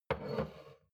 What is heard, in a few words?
shot glass place table slide soft; berufe; gastronomie; soundpool